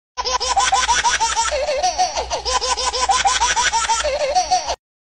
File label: laughing hamster